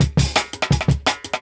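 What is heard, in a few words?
Jungle Breaks, 170 bpm; Mechine; Original Jungle Breaks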